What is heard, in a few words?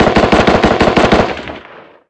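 gun m16 medium; ModernWarfare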